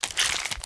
small gore; factorio 2.0.55

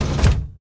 train engine stop; factorio 2.0.55

